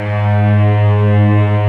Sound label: Textural Strings; E-MU Producer Series Vol. 2 – More Studio Essentials; Composer